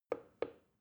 plastic button start click soft slow

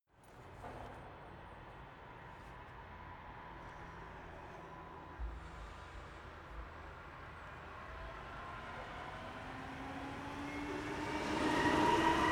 train city train accelerate depart long

